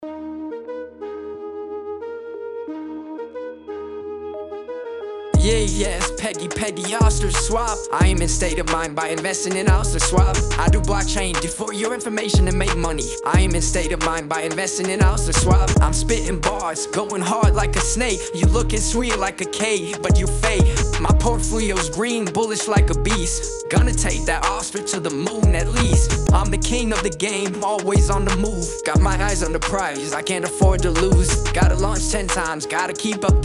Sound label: rap